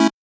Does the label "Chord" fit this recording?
Yes